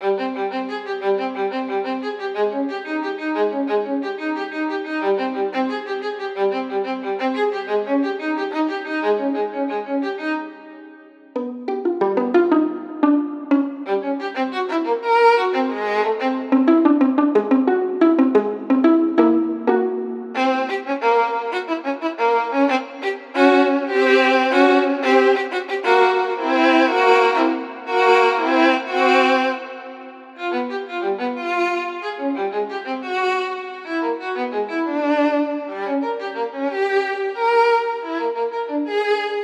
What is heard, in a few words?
String Solo